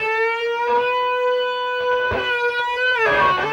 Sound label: Snake Bend, Electric Guitar; Sonic Foundry (Sony Creative Software); Friendly Fire; Serpent